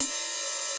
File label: Ride cym, Roland JD-990; Drum Samples